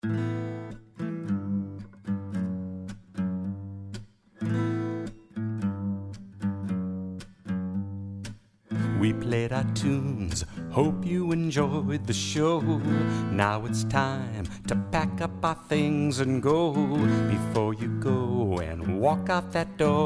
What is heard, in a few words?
Guitar